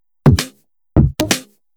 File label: Electro Loop 128BPM; Vengeance Samples